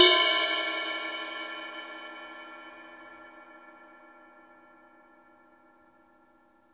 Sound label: Drum Dry; East Collexion; Drum 1